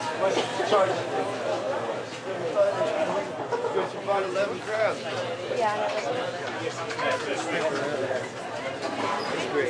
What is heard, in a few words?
Ambi, indoor